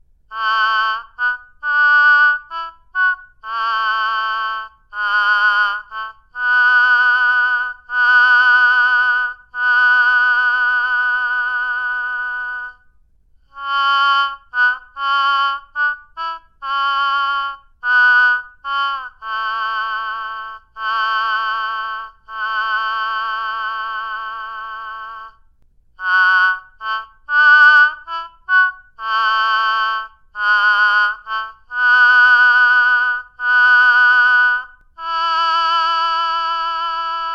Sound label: sweet mute